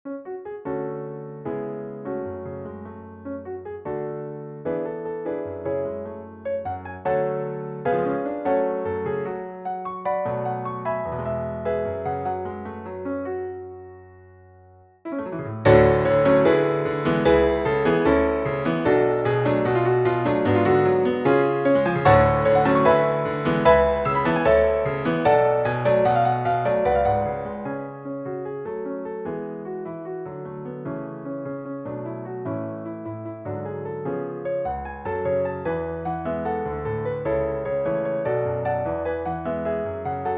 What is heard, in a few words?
piano; naruto shippuden